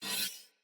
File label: Dagger Whoosh Accent Heavy, Swords; Weapons; Player; Josalfar